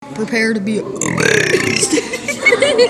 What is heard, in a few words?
a burp